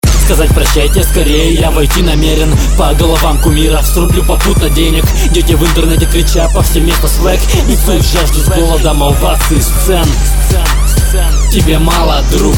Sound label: Fly Beat